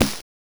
Snare, Genesis; Streets of Rage 3; M8

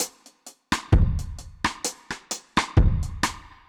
Drums Wet 130, dub drums samples, 130bpm; musicradar

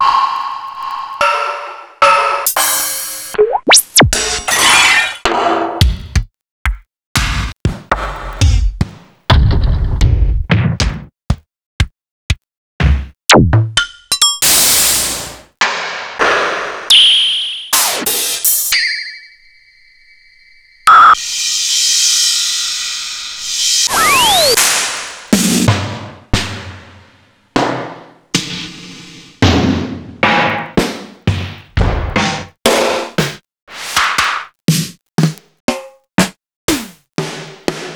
Fairlight CMI III

